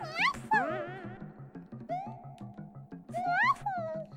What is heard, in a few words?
noise; Yoshi